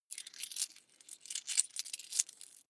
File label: coins clatter medium long